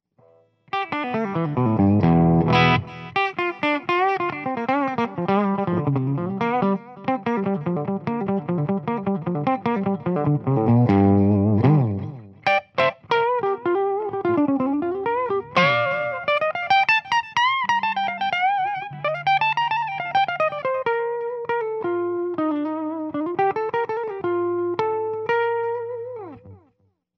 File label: bridge clean, goldtop; wcr ironman